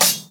swing hit stuff, weapons; darksouls

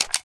awm clip tap; weapons

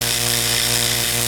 magic electric

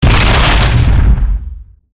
security bot explode; gow

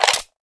30cal cover down; m1919; weapons

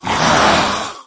evade right solo, maggot; doom3

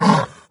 pain, doom3 pinky

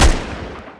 leopard mg; weapons

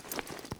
ru-556 deploy, weapons

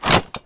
armor

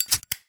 ignite; snds jack gmod

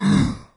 melee; doom3; commando

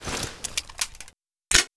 mass load

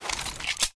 mg42 raise bipod; weapons

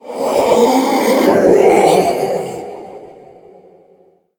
death; gundyr

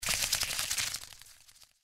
wing turn; npc; cazadore

